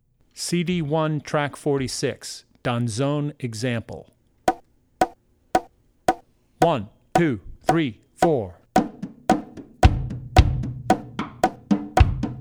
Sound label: Danzon; Drummer's Bible Tracks